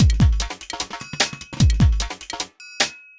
clout 150

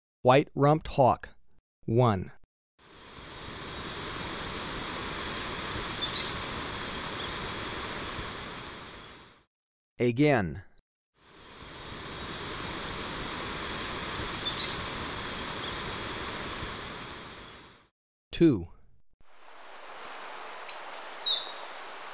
White-rumped Hawk; S. America

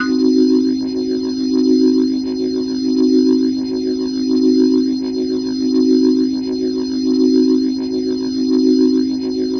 Glowing Organ C Dim, Organ Licks; Hip-Hop

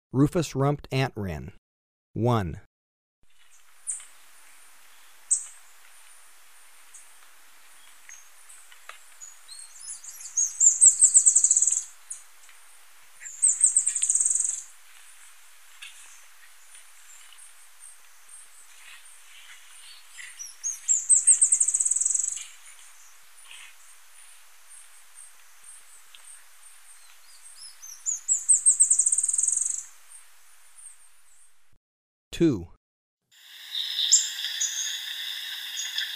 Rufous-rumped Antwren; S. America

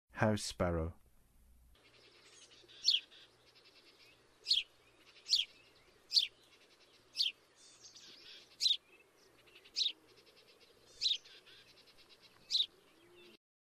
House Sparrow; North America

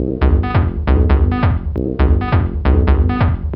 Synth, Techno

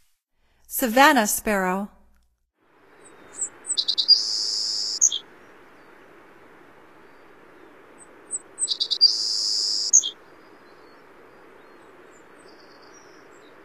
Savannah Sparrow, Songs of Birds of North America; Stokes

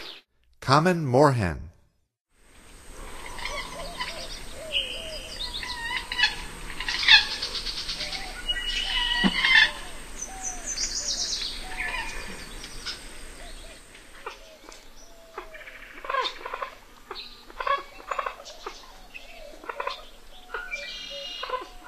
Common Moorhen; North America; Stokes

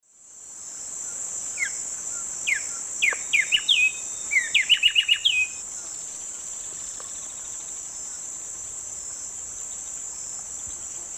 Arremonops conirostris, song; S. America; Eastern Lowlands

